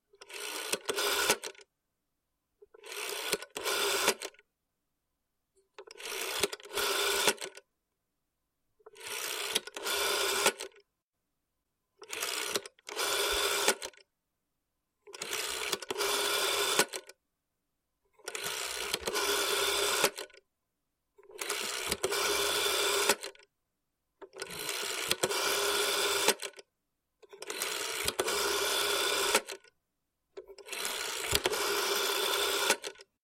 nabor nomera na diskovom telefone

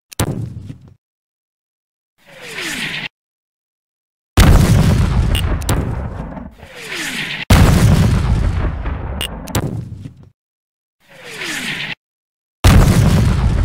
minomet

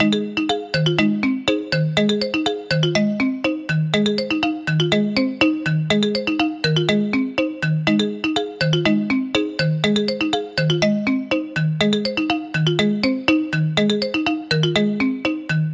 muzyka dlya igr

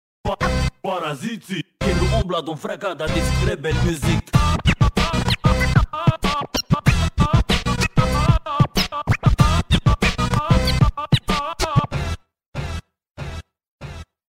zvuk skretcha muzyka

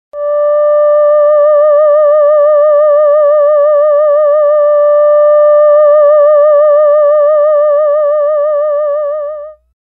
termenvoks nota re

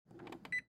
datchik holod pisk

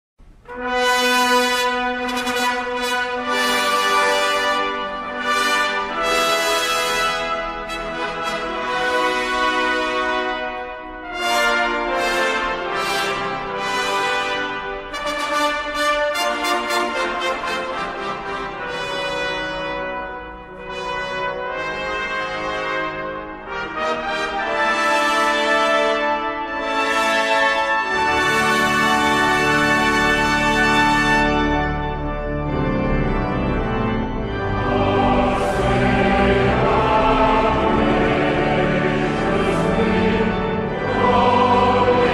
gimn velikobr

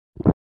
glotaet

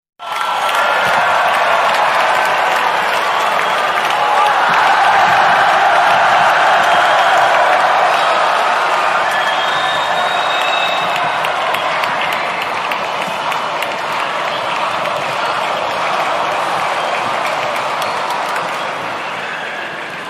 zvuk bolelishikov hokkei

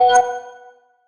zvuk poyavleniya logo

